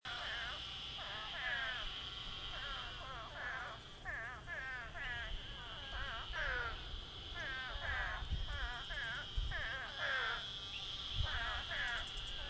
emergent pond symphony

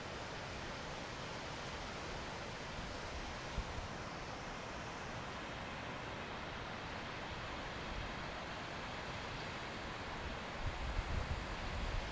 gavarnie falls

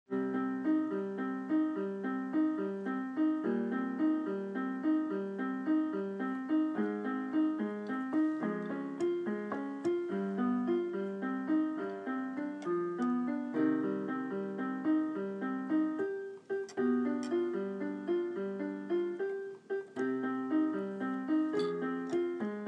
moonlight sonata on piano

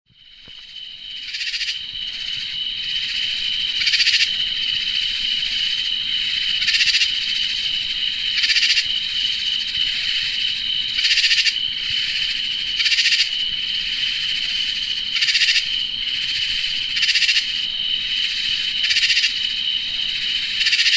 backyard sounds; rancho deluxe

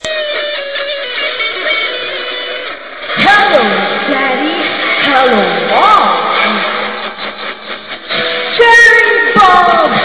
i m singing

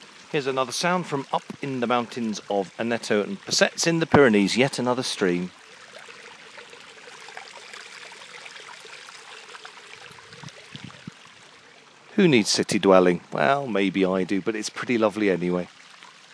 Aneto mountain stream

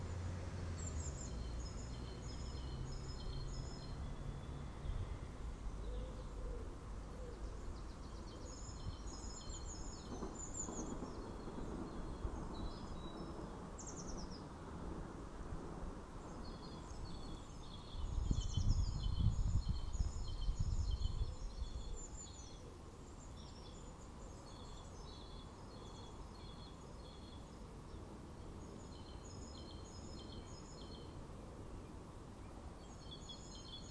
early morning ambient birdsong

